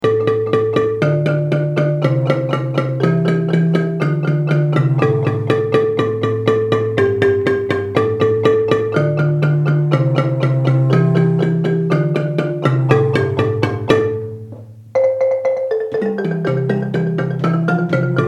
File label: bari, marimba; carnival